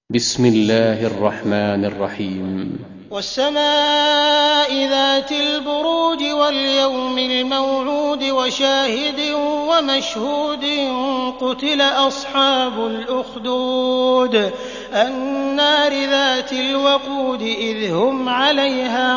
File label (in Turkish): Buruc, kuran; sudeysi sureler